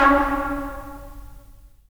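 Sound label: brass trumpets staccato, right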